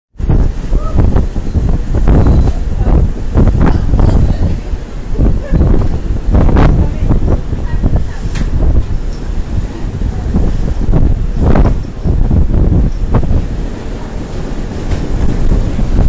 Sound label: bluebridge ferry viewing deck